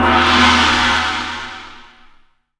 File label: Gong; Zero-G Jungle Warfare 1, 2 & 3; 90 s Sample CDs